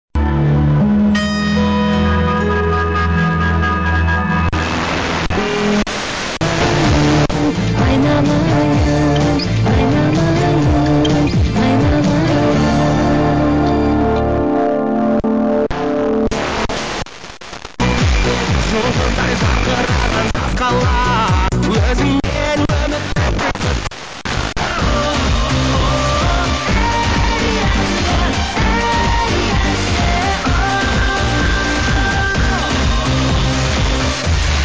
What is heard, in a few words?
85 110 MHz